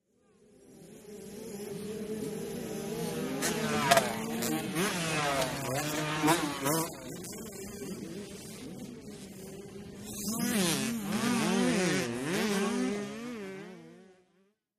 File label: Motorcycle Motocross; By 50 Cc ( Several ), Cu Fast Bys R / L, Shot Cu.